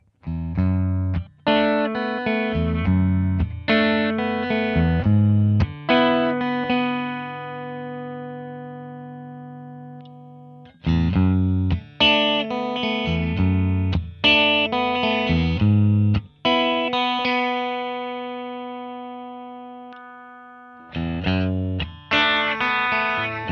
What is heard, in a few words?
Bluesboy Sample Fender Twin Reverb